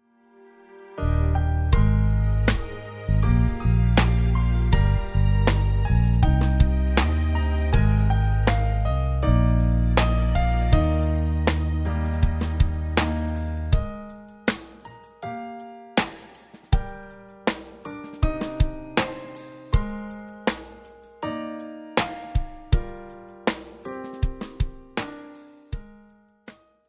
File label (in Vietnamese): Nhac phim dien tu nhe nhang vui tuoi